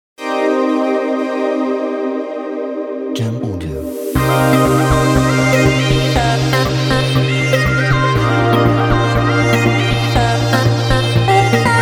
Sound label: City Vlog Tech